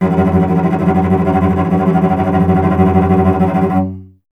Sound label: STR VC F#1, Cello; Miroslav Vitous; Solo Instruments VOL-1